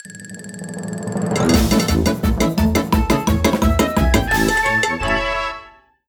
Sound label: Results Screen Jingle Music; Doodle Jump Space Chase